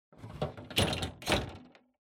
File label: Air Conditioner Panel Close